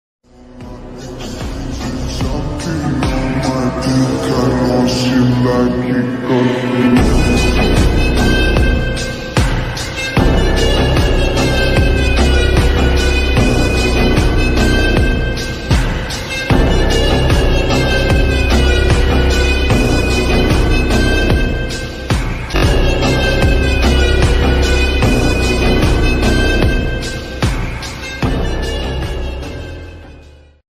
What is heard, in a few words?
attitude ringtone; sigma male